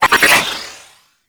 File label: Glaive Throw; Warframe; Tenno; Teshin